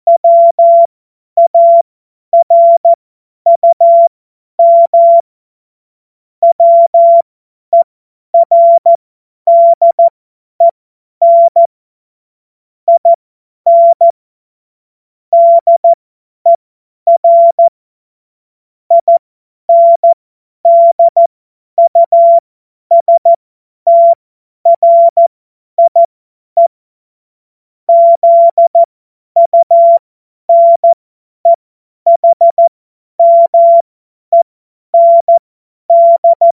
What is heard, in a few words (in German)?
Witz, Morsekurs, cw morsen; Tempo 50 F70; hamradio; cw witze